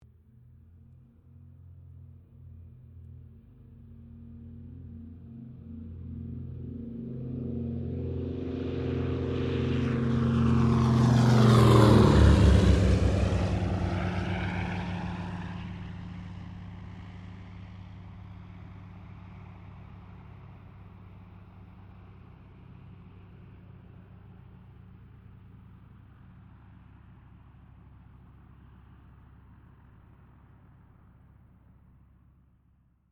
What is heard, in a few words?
AIRCRAFT DOUGLAS DC3 TAKEOFF; Qantas 1942 DC3; Airplanes; Vehicles